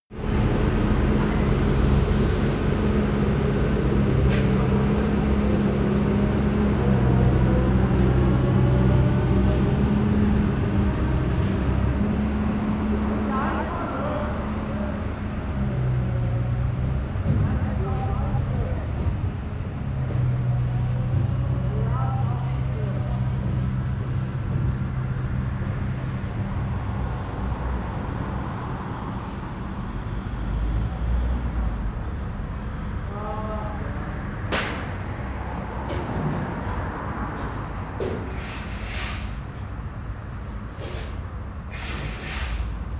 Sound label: construction site